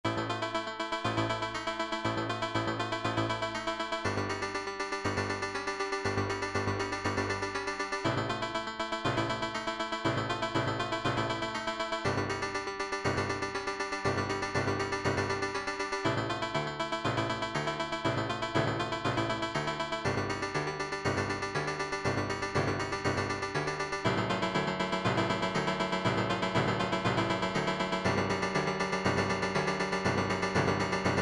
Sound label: mid esq1 mono timbral